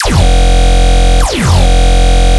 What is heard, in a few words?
Phase Plant, bass